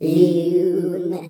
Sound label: electric firefly